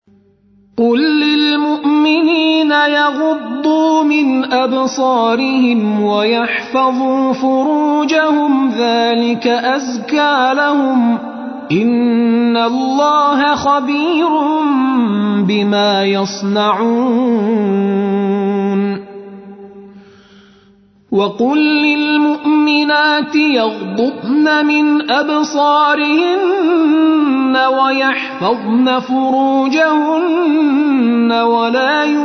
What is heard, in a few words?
Tar